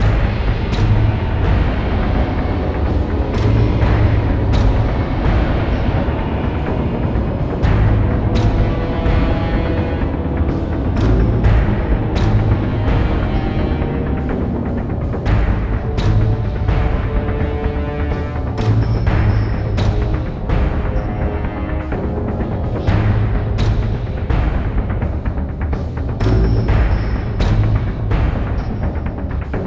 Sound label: slow; Quake 4 Alpha; id software